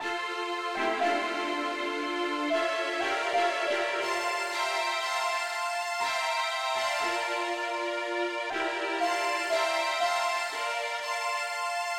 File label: popukelead key f